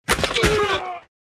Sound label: Rugby tackle